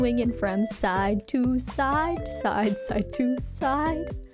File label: Singing; IRyS